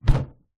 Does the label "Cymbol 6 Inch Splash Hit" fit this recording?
No